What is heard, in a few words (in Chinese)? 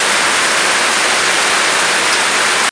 电视机雪花兹拉声; 搞笑音效